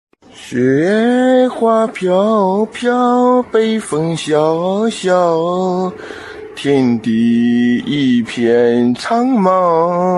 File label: levelup; minecraft